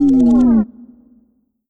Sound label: vox idle sad, dog; npc; scripted